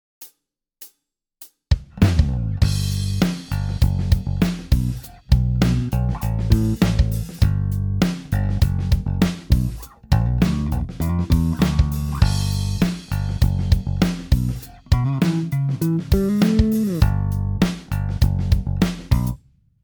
Bass Massive In

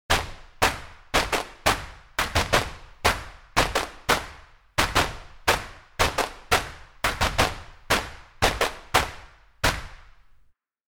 Body Percussion Clap

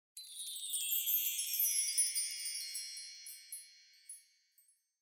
Windchime Stylus

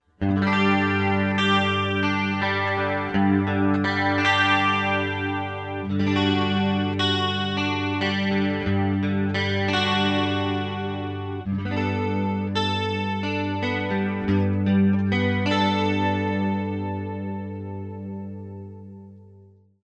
CLEAN GRECO TRS60

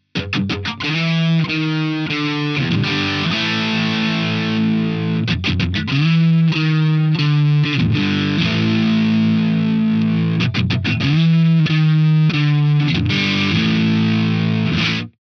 OD GRECO TRS60

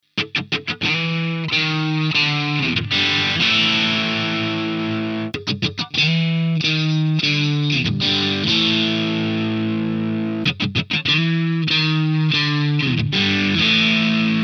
Fender Japan Stratcaster

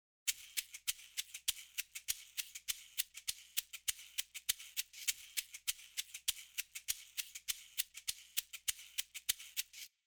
Shaker Stylus

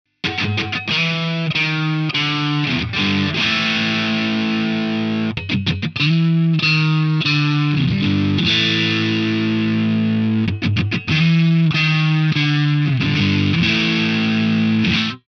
OD YAMAHA SG

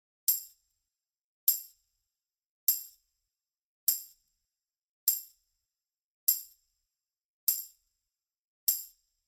Tamb Real

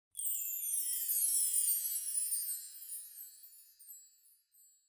Windchime Real

